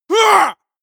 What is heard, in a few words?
male combat attack short